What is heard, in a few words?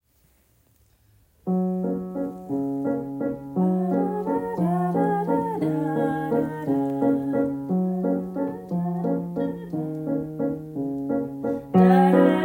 Echo waltz: Secondo